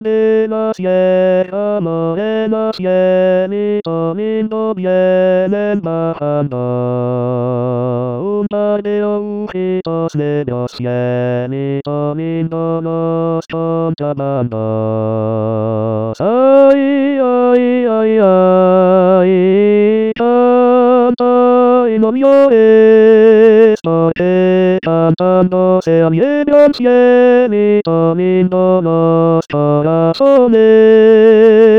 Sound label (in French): Tenor; Cielito Lindo